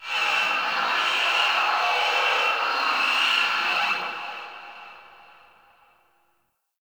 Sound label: Energy Wind Texture Med, Corpus Ship ambience; Textures